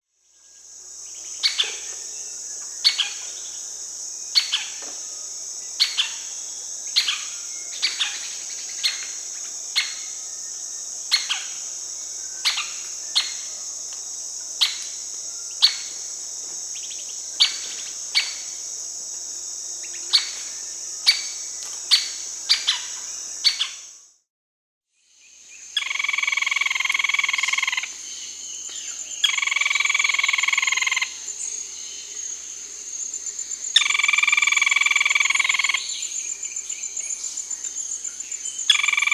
Olive-backed Foliage-gleaner (Automolus infuscatus), Peru; Birds of the Amazon; S. America